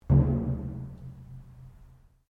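Drum Taiko Odaiko piano, Large Japanese Taiko Drum; Miscellaneous Musical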